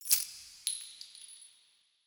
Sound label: ui debt